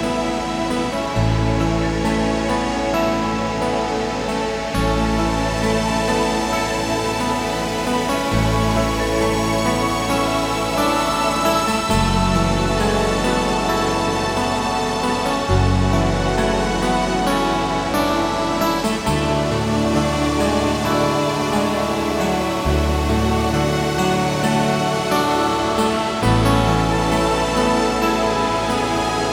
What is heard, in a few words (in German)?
Meditatives Fliessen